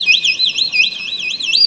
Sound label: VIOLIN BIRDS; Best Service; World Colours